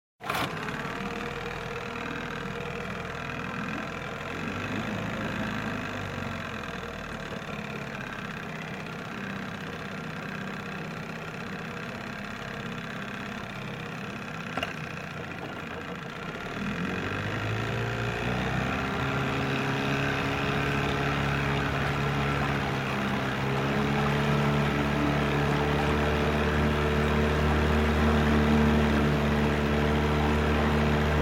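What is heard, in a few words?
roar of racing ships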